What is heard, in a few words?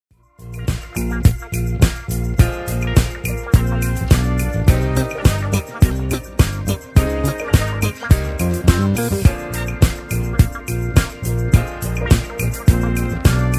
Instrumental